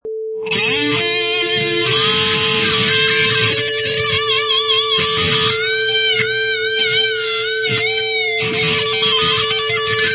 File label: Distorted Guitar Solo 87 bpm in Cm Loop